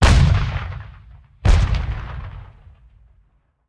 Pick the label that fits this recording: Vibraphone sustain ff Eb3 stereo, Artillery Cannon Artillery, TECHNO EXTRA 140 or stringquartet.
Artillery Cannon Artillery